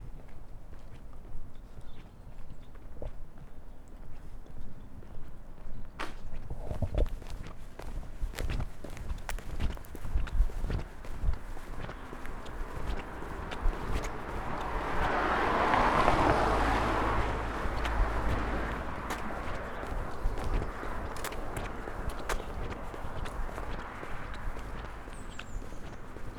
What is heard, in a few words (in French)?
voiture; place leclerc